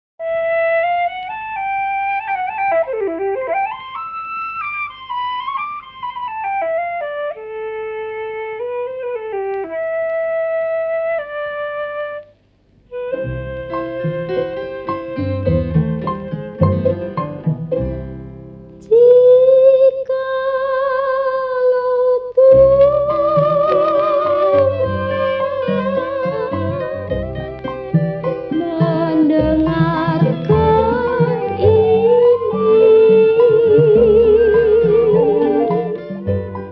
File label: kroncong